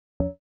Drum; hydrogen

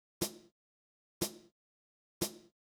Pedal HH; hydrogen

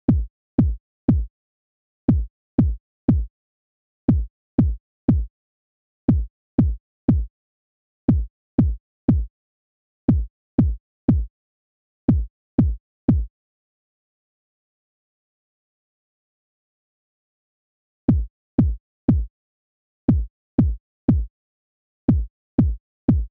Kick; hydrogen

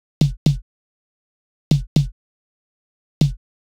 Snare Low; hydrogen

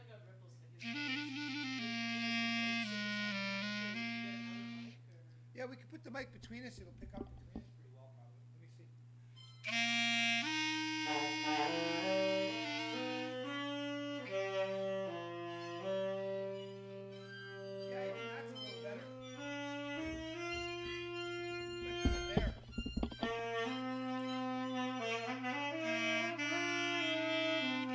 instrumental

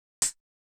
Pedal HH; hydrogen

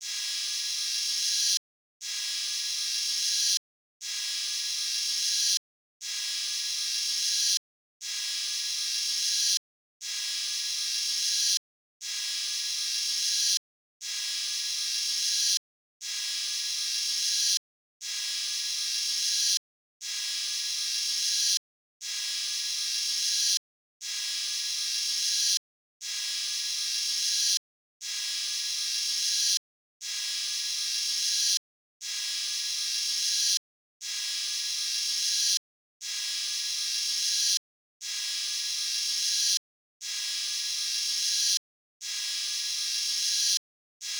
ride; hydrogen

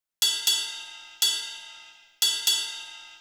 Ride Rock; hydrogen

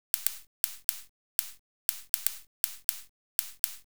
sim chh; hydrogen

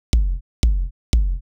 Kick Low; hydrogen

